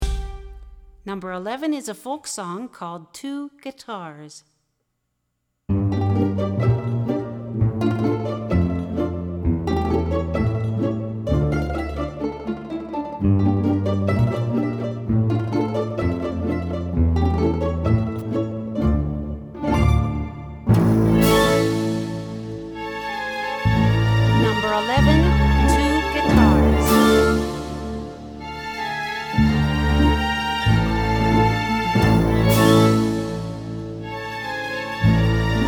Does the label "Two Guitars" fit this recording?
Yes